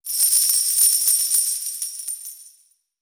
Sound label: Coin Reward